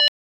warning small; Electricity; EK modern

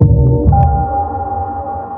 sonar Ping Air Close Shuttle; Barotrauma; Real Sonar

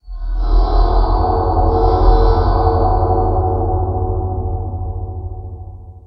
Ambient; Barotrauma; Room FX

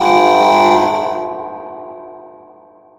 warning single; Electricity; EK modern

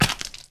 wet splat; Impacts; Thalassophobia Restored

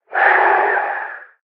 Hiss; Barotraumatic; Razzershrimp

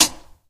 syringe gun; Weapons; Barotrauma

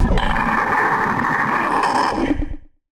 attack; Barotraumatic; Crook

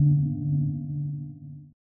sonar Tail Air Far; Barotrauma; Real Sonar

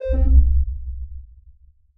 sonar Ping Air Close Beacon; Barotrauma; Real Sonar

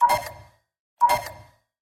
Alarm; Barotrauma; TSFX

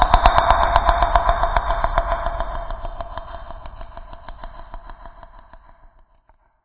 death; Barotrauma; Barotraumatic; Legacyendworm

